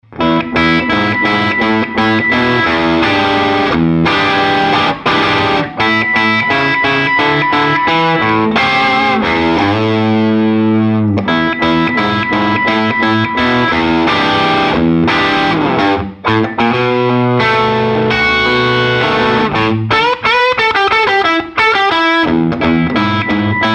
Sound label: Crunch